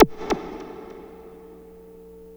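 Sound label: wall moog, bass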